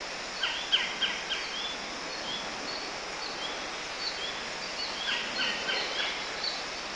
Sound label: bird